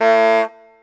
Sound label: squeeze honk